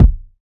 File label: Kick Drum Sample B Key, Royality free kick drum sound tuned to the B note. Loudest frequency: 110Hz